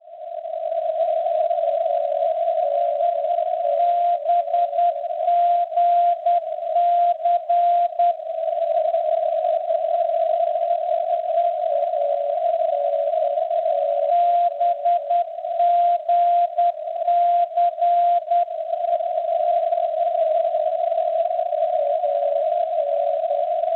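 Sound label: non-directional beacon 374.5 kHz; ANC; Ancona; Italia; Schwarzsee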